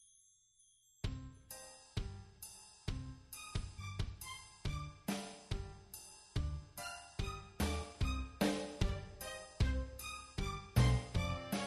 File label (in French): instrumentale